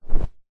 foley clothing jumper sweater pullover drop set down